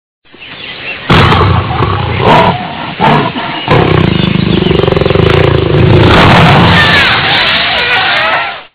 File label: jaguar